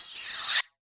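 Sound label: Reverse Crash; drum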